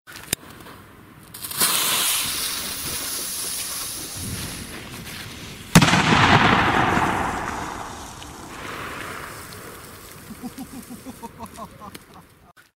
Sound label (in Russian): so smehom; Звук петард